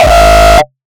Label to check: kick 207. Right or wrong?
Right